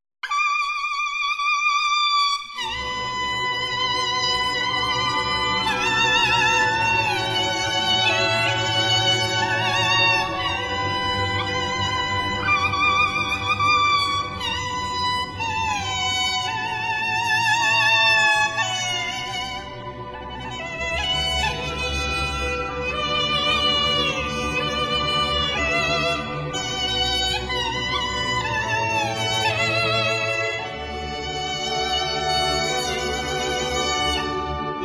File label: guan, chinese music